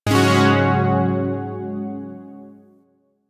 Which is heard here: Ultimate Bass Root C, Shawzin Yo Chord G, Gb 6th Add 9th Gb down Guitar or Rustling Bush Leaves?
Shawzin Yo Chord G